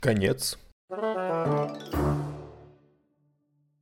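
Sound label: end and melody; zvuk so slovom konec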